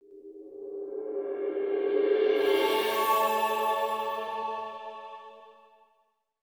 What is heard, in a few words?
HEAL CAST